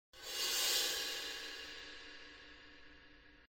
Cymbal Cresc; Alice: Madness Returns unreleased original soundtrack

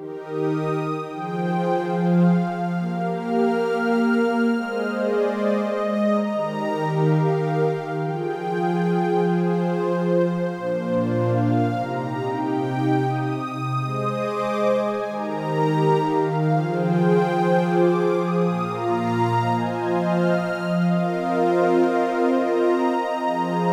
Spring Slope Descent; Proteus

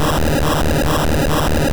Fire Blast; sfx; attack moves; pokemon; gen 1; rby

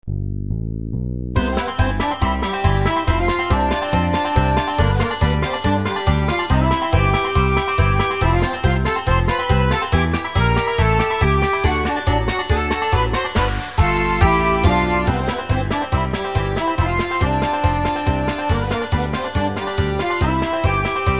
tavern; Game Music Finland 3

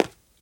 run tile

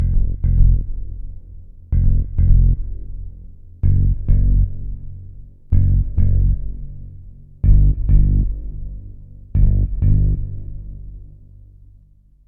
Ditty

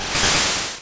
Whirl Wind; Pokemon; Gen 1; attack moves; RBY

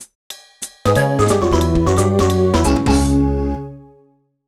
Lose; mario vs. donkey kong minis march again; nds

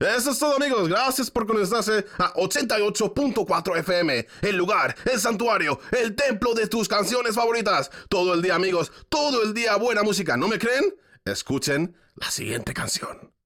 Music Vehicle Radio Events

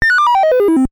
Bird Dropping Item; Donkey Kong Jr. Stage 2; animal crossing full gamerip

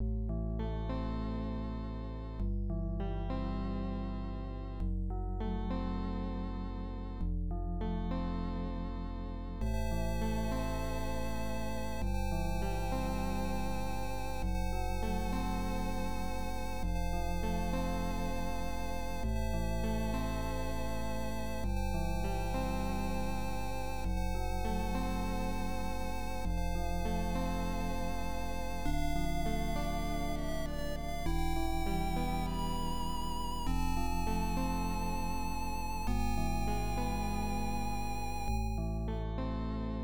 Sadness; rusty pc-98

